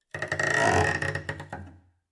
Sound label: Cello Scratchy Noises; SoundsCrate